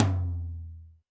Tom Lo Dynmic, Alesis D4

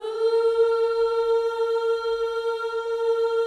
OOS A#, Vocal Gospel

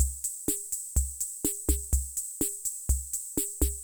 Loop, classic machines; arranger

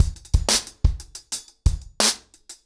ACOUSTIC DRUMS, 90 BPM; The Roots Drumloops; Locked Out

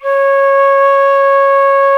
C#4 FL, FLUTE; AKAI S-Series CD-ROM Sound Library VOL-7

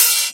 Open Hihats, Cymbals; Vengeance - Essential Clubsounds Vol.5

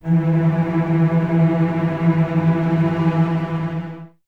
cellos E 3, String Ensembles, Tremolo; Miroslav Vitous

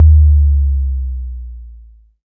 Kick Longer, Modular

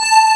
DG WAVE, DIGI SYN

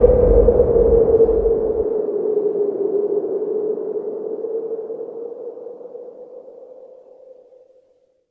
WIND

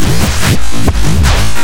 RING LOOP; Big Beat Samples & More; AKAI; ALL LOOP KIT

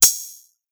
HiHat Closed; Drums

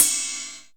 FX Cymbals, Percussion; Dance Synths

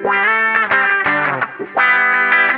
GUITAR

